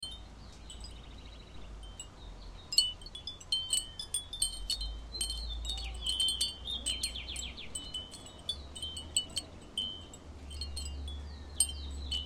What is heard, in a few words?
atmo arizona sedona chimes and distant bird